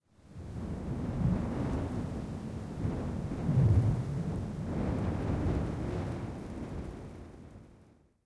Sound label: cemetery, snow